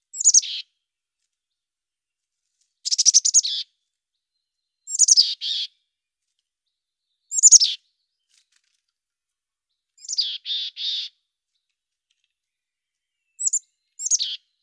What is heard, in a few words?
chickadee mountain; birdsong